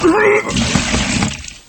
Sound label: Puke